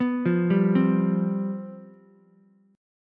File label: C Major 7th Suspended 4th, down, Ukulele